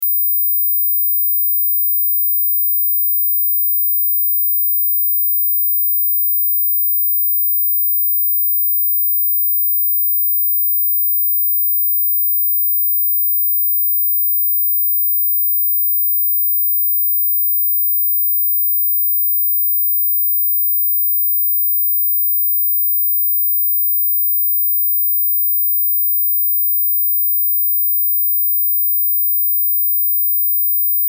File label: Test Tone Sine; Sine Wave 15Khz 60 Sec -12Db Loop; Blastwave